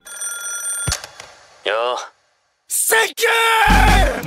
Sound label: cellphone; senku; dr stone